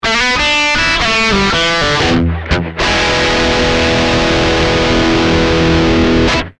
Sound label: smoke stack ii dirty bridge coil